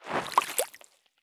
turn puddle; Escape from Tarkov

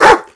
animal dog bark alt; Call of Duty Modern Warfare

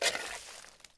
Dig Dirt; Civ4 warlords

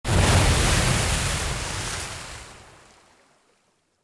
bomb material water; War Thunder

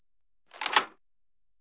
12mm m2 mech, guns tanks; War Thunder

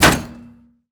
door ladder hatch close end; Rust

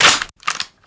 Kriss reload clip in, foley; Call of Duty Modern Warfare

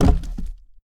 wall external wood deploy; Rust

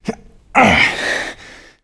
player climb efforts

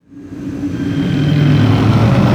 armada speeder appear

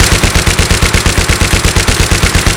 Machine Gun Fire 520rpm; Weapons Automatic; DCS

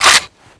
wp foley mp9 reload clip in; Call of Duty Modern Warfare

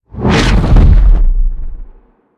shell passby; War Thunder

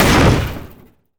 Aircrafts Canopy Tear Off; DCS

